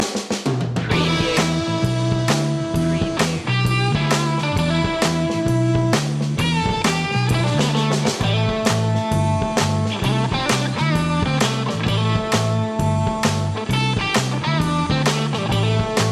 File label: such a cinch no rhythm guitars